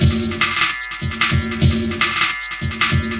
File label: 150 flangeverb breakbeat; 141 to 160 bpm